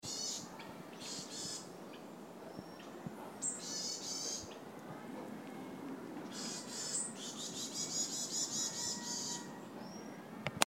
yamagara, tori

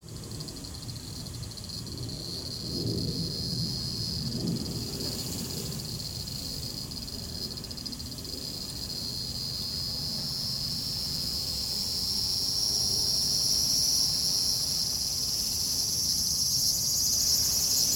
semi aburazemi

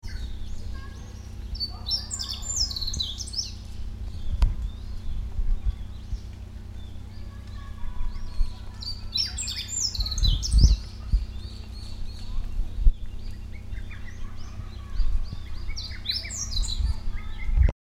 tori hojiro song

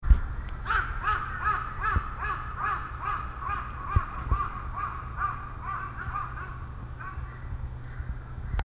hasibutogarasu, tori

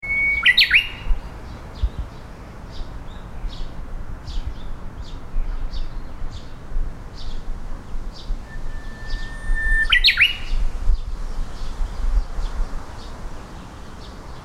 tori uguisu song

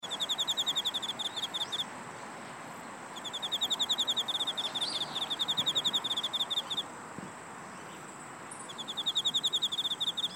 mushi korogi

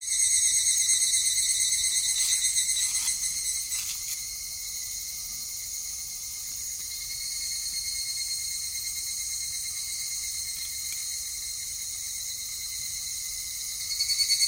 semi higurasi

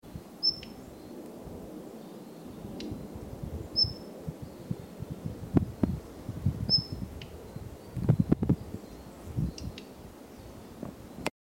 tori jobitaki

